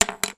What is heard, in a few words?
office small plastic pencil sharpener put down on table